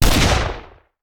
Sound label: Rifle; Sound Ideas; Guns